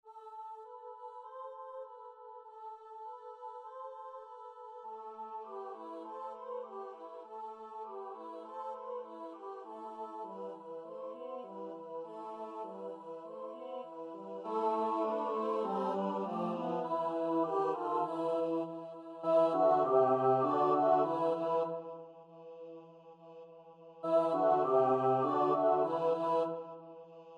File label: midi; przebudzenie